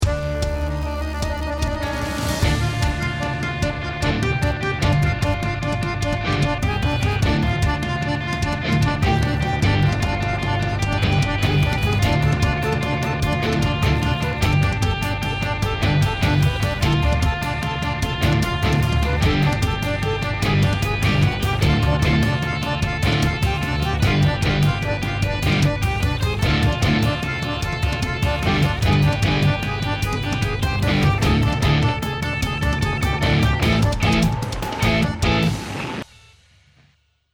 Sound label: bomb planted